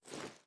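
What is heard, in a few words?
sniper reload cloth; gmod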